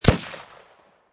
Rifle Thompson Center Encore 7Mm Single Shot Exterior At 45'; Rifle: Thompson Center Encore 7 mm, Single Shot, at 45 feet. Version 2.; Rifle Sound Effects